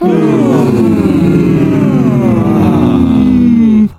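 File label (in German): tür zu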